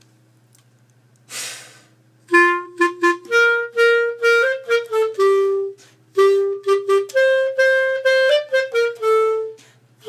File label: clarinet